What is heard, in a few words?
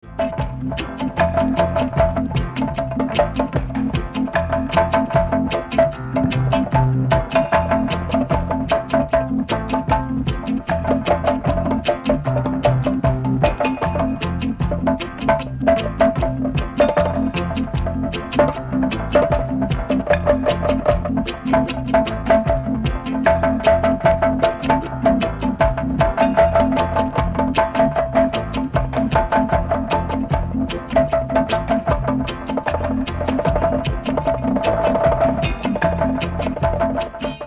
drums